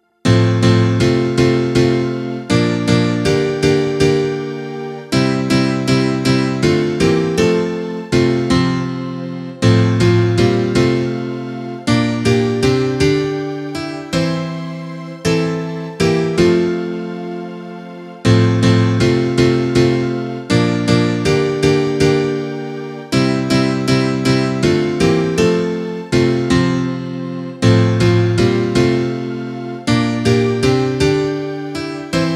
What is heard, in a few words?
instrumental